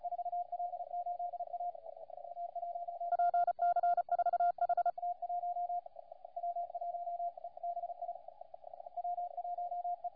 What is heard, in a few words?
CW 7MHz; CQWWCW